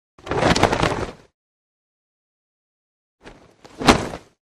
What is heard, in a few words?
Cloth Heavy Cape Whoosh; Hollywood Edge Foley Sound Library